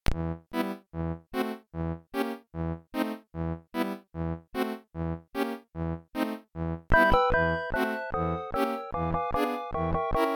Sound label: orchestrion 8580 resid; c64